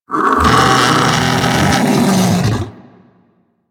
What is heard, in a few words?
Vocal Alerted, critter; Warframe; Hunting Critters; Undazoa